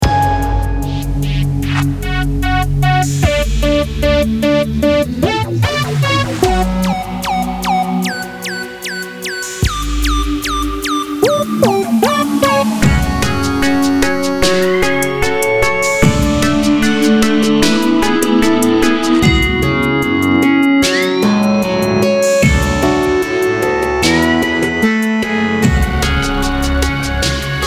strange sanctuary key up loop

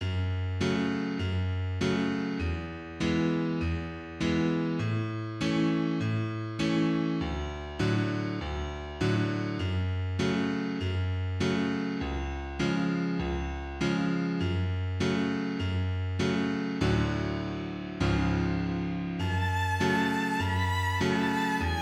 Sad Song

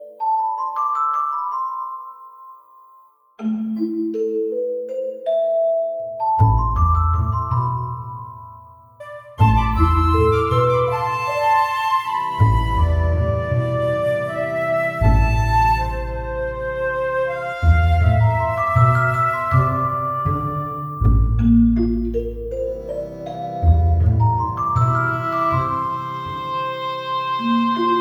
music level sokoban

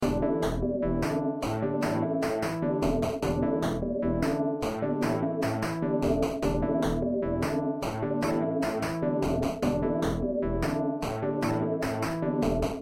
a simple music that fits a results/end screen

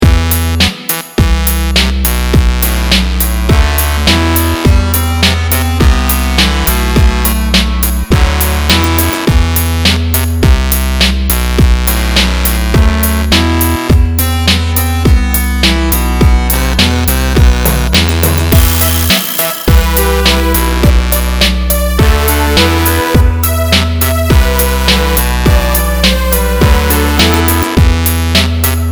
ominous groove; bobjt

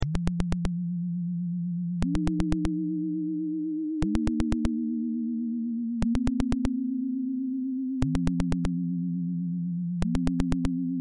intro wavy bgm

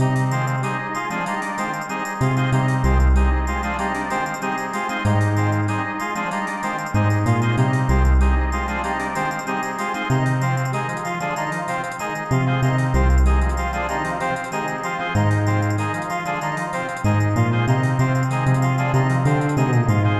crosswalk waiting music; 2020 eclectic music pack

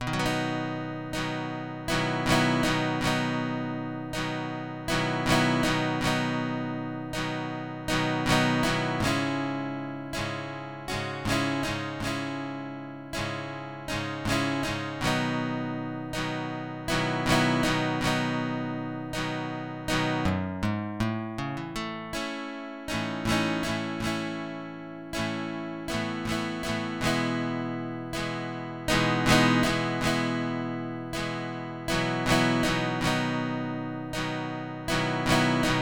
Calm fireplace guitar song

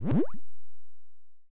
into ground; gamuking

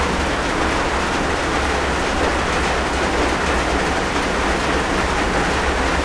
rain loop

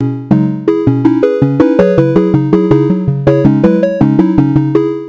game music

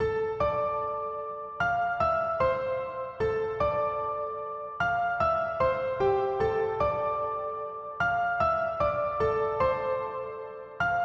South Port, Nise short song

